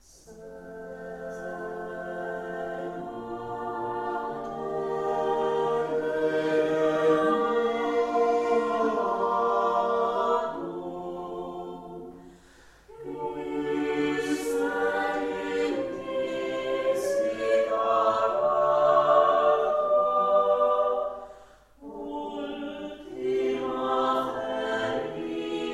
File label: Sibylla Erythraea a capella; Lassus